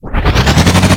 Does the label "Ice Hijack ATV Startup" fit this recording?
Yes